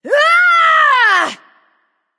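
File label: battlecry, female; smite; voicepack; hera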